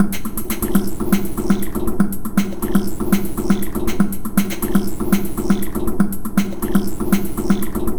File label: Minimalism, Processed Percussion